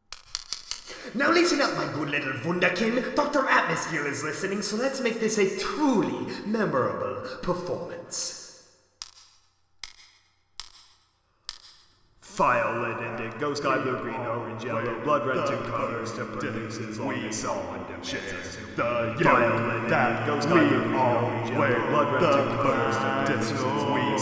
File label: Choir, Orchestra Mix; CWC Choir; Indigo Orchestra Mix